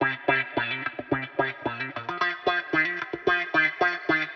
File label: 110 C Simple Groove, Spaced Out Guitars; Loops; Touch Loops; CosmicFunk